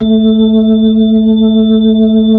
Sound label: B-3 Chorus; Roland LCDP10 Keys of the 60s and 70s 2; Br. Ch. Xfade; Br. Chorus Wh